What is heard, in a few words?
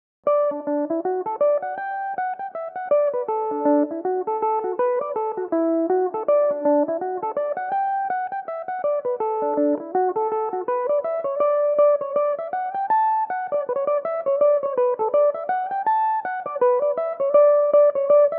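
reel D major, Irish mandolin; Morrison's Reel; Belmuse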